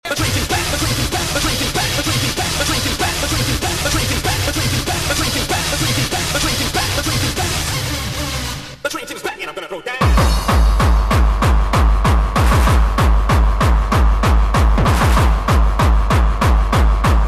hardcore gabber

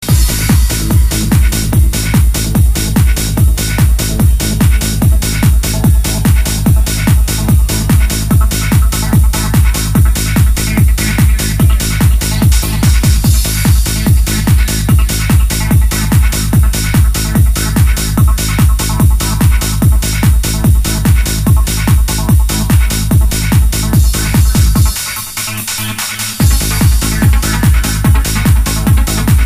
Happy Strings; Street Parade 2001

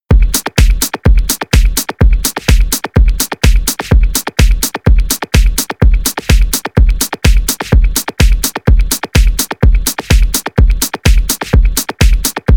Hot Beats 126 Bpm, techhouse

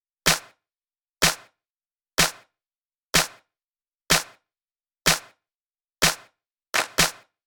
Clap; techhouse

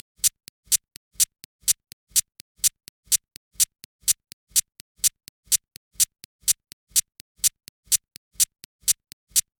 Call Me Parts Hat Short, techhouse

